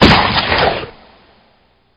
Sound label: gun shot; Crash Bang Boom